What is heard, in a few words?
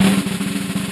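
SY77 snare drum ROLL; Crypton - DrumBase5000; SY77 DRUMS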